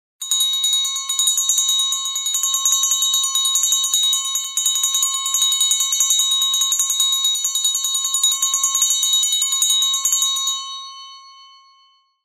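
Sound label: sleigh bells for New Year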